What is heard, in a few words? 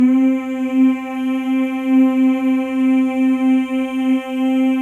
male; Spectrasonics; Symphony of Voices